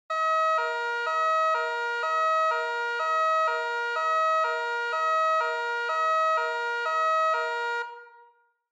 Police Car Siren Close

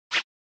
SNES Baseball Swing

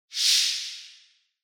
Noise Sweep Mid

